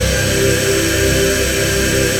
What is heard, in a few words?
ATM TONAL; Best Service - XXL Production Effects